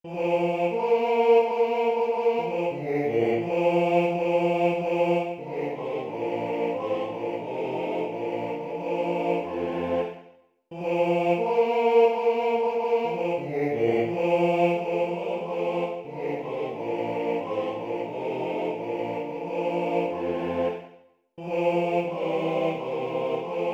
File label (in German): Reiterlied Maennerchor a cappella